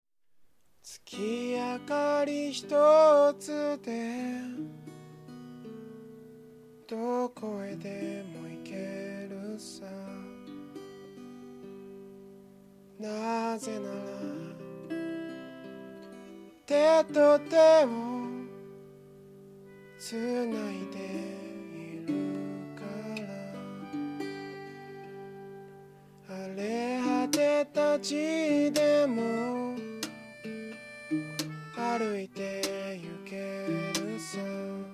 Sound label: music